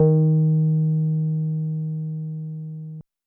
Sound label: Square Med D 3